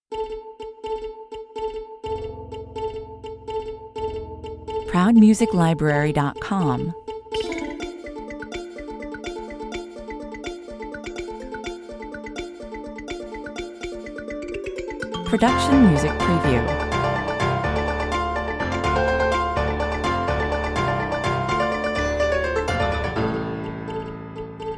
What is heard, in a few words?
tick tock